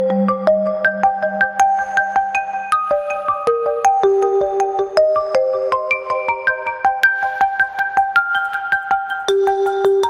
drop after drop musical tone

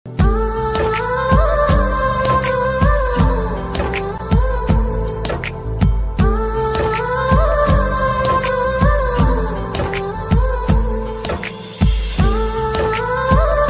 love music ringtone, bollywood

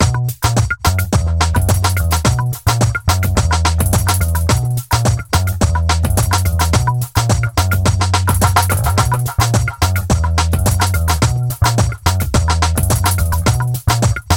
latin beats ringtone

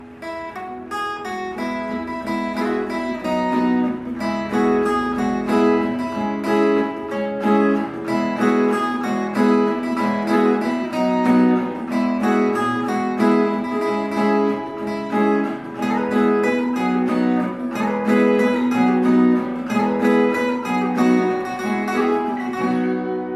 tik tik guitar version ringtone

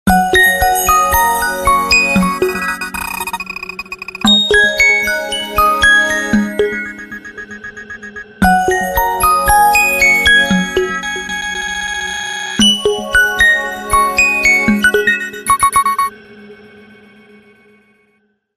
lumia 800 ringtone; halcyon

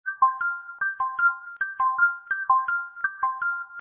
xiaomi 12 lite sms ringtone

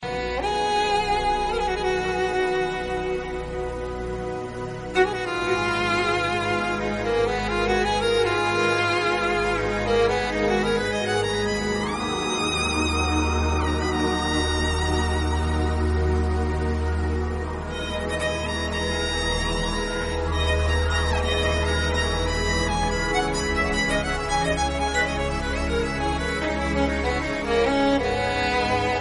most romantic classical music tone ringtone

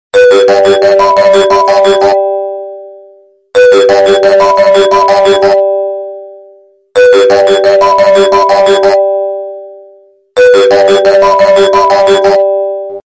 iphone original ringtone

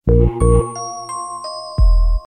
cute soft music message tone ringtone

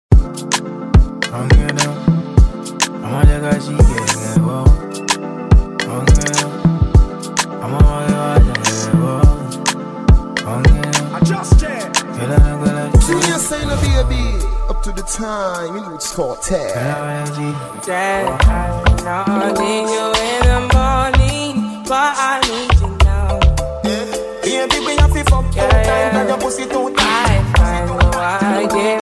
hip hop musical song ringtone

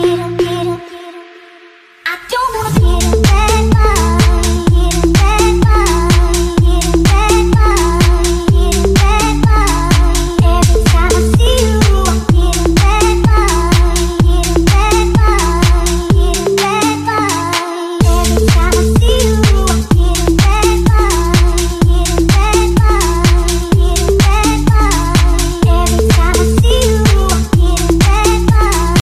electro house english music ringtone

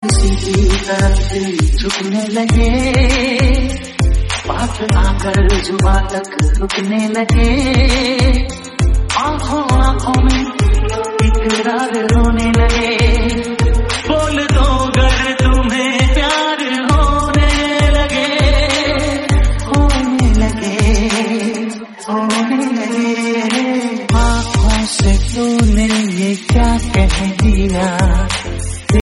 lovely songs mashup tone ringtone, Bollywood

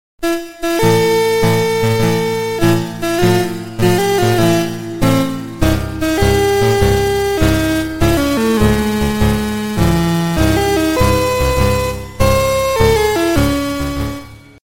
coolest sms tone ringtone

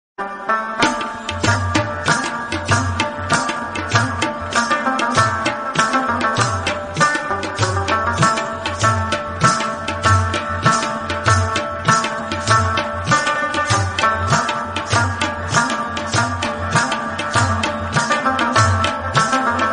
lovely pashto music tone ringtone

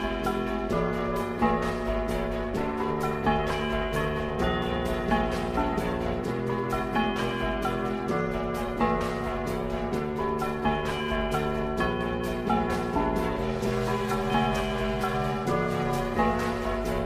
mix music ringtone; samsung

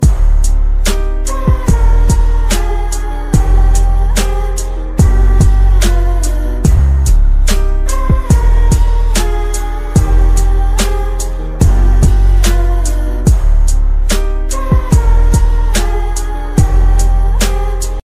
music instrumental ringtone

